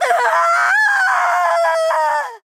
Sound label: Falling; paladins; Flak